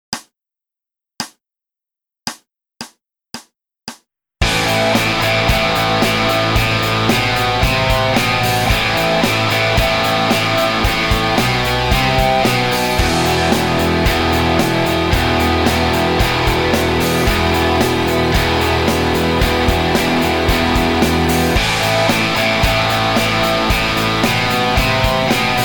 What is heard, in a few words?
rock quiet, guitar; total guitar; guitar lessons; initial stage; quiet as a bulldog